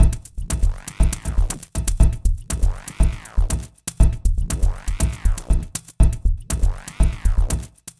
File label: Candy Machine, Processed Percussion